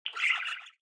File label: budgie sound; brasil